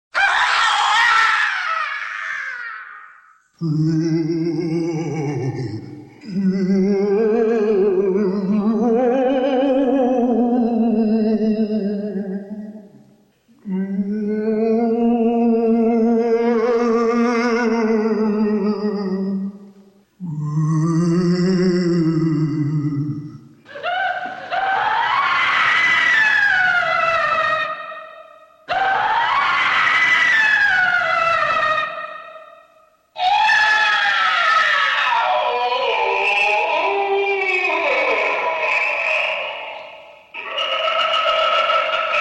Screams and Groans; Halloween; Haunted House

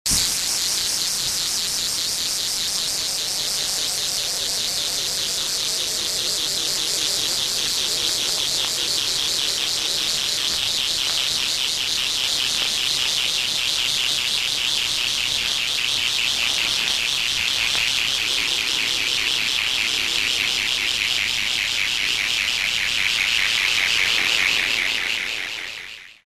ufo

